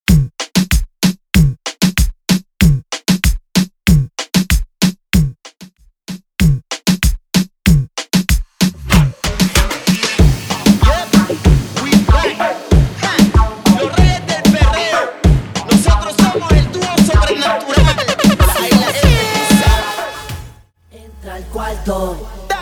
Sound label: Intro Break Segway 95 BPM